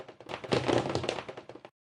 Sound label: Explode Head; ExplodeHeadSecondarySFX